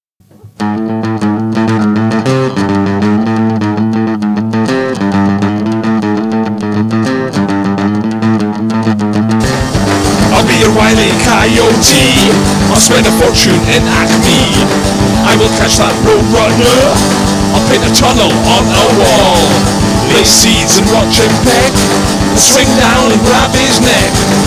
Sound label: meep